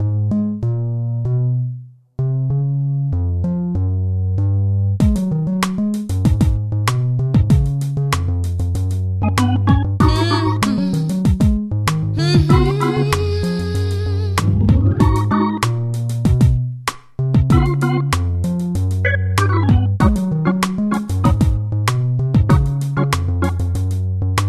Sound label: organ moody; sarmacja